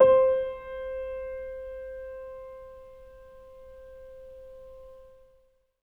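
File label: Acoustic Piano, Right; InVision Interactive; Miroslav Vitous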